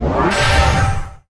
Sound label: heal